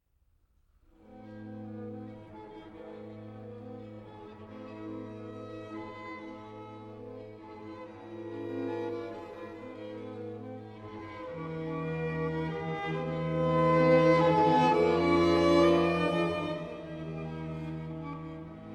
string quartet 13 in a minor allegro ma non troppo; schubert; op rosamunde